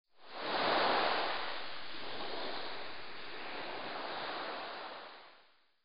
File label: sea